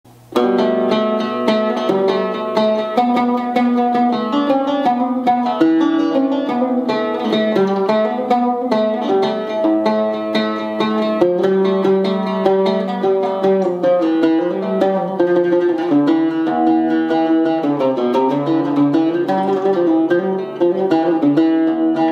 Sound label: Oud